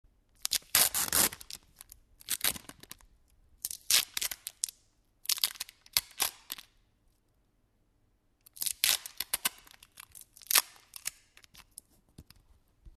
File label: Sticky tape